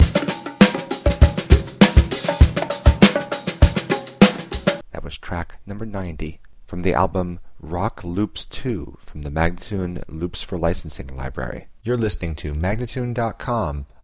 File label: 100 E spoken, Rock Loops